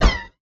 shoot laser

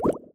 shoot bubble; Epic Toon FX

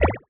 shoot bubble; Epic Toon FX

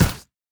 explosion sharp; Epic Toon FX

